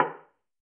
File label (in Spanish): Madera; el chavo una feria en la vecindad; ios mobile gamerip 2012